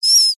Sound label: animals bird baby seagul cry single